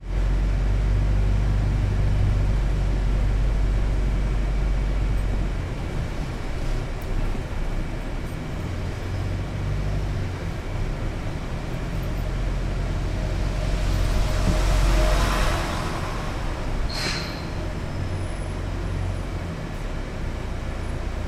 transportation bus london city centre onboard upstairs moving